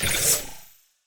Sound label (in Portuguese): Templo Scarab; Super Mombo Quest